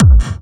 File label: Bassdrums Clubby Kicks; Vengeance Samples